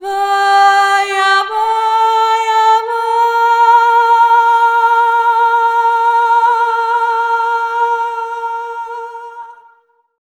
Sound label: VOX, Balkanish Traditions; USB Soundscan vol.63; AKAI